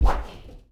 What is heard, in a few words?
rush; Spectrasonics; Liquid Grooves; RUSH SIGN